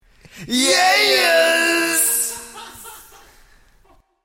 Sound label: yeahhhhhhz